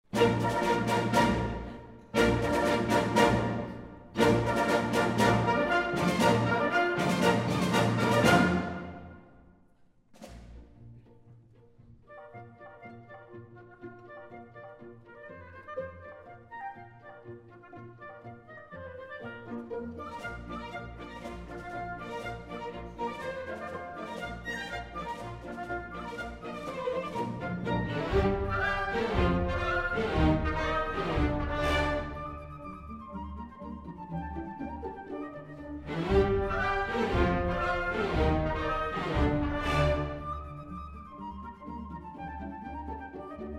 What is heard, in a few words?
march; liverpool philharmonic; rossini arr britten